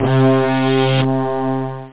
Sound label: Filter sweep